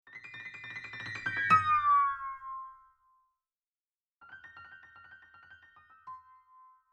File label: Blues, doppler shifted ringtones iphone